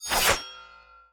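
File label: cavalry spear, weapon; Battle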